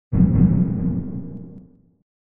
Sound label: distant explode; Dystopia; fortress